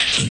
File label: RSS, 160 BPM